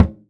SURDO L MUTE; AKAI S-Series CD-ROM Sound Library VOL-5

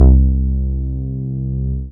BAS MINI, Mini-Moog

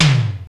DDR30 CT; Crypton - DrumBase5000; RZ1

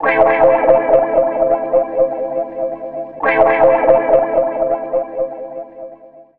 150 A, Guitars; Total Drum&Bass; 90 s Sample CDs

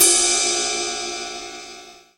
Long Ride, Mixed Percussion; Jungle; Percussion Hits; Zero-G Jungle Warfare 1, 2 & 3; 90 s Sample CDs

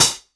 Solid Bruiser D24, Dimensional Hats; Airwindows

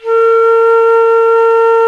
A#3 FL, FLUTE; AKAI S-Series CD-ROM Sound Library VOL-7

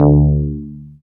BAS FM DX-7

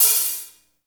HH hh; Best Service Gigapack 2

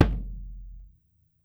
SURDO, Brazil Drum Perc; E-MU Producer Series Vol. 7 – Old World Instruments; Drums&Percussion

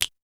Hip-Hop Kit Fingers; Roland Juno-D

